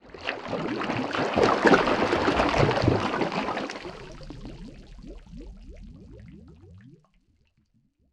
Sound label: Underwater Water Splash Gurgle Bubbles